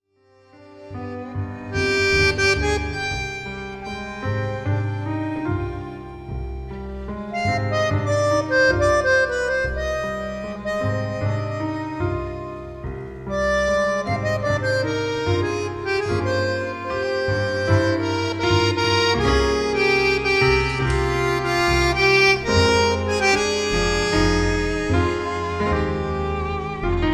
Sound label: Bandoneon